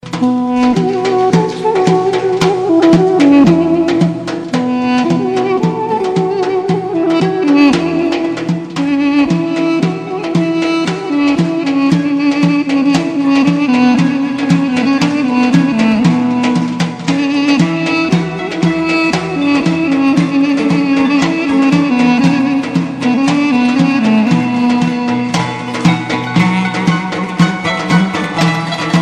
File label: Armenian Duduk